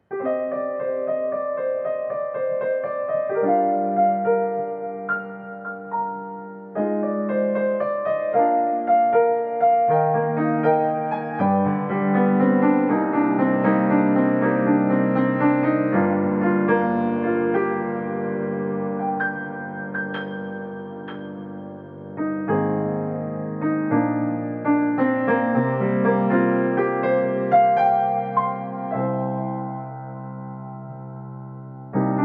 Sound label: reverb short piano